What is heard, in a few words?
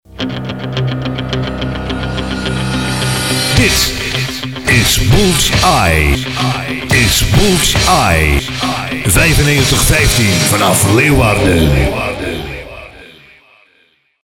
Jingle; Bulls Eye